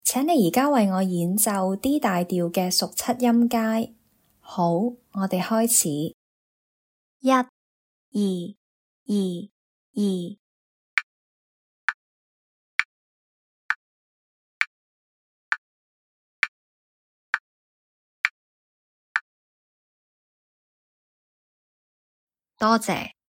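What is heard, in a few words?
dominant 7th in the key of D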